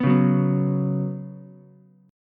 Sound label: Db 7th Suspended 4th Db down Ukulele; JGuitar